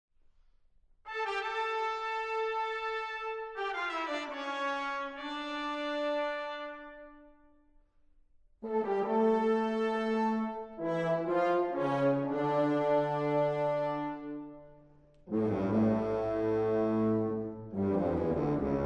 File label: Toccata And Fugue In D Minor, Symphonic Wind